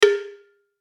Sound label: klunk